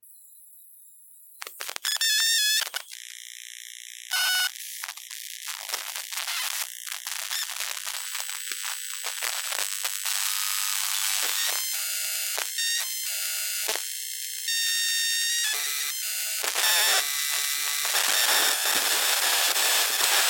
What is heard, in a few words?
Electromagnetic Field; Glitchedtones